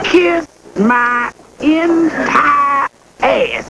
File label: a kiss